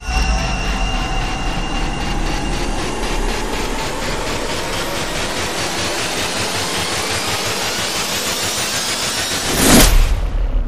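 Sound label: Rise Rhythmic Blast Off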